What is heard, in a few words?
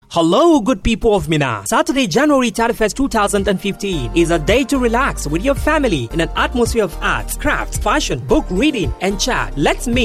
bookshop jingles